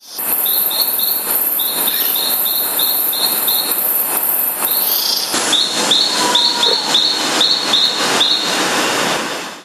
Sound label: Dendrobates auratus; Cerro Asuncion; Limon; CR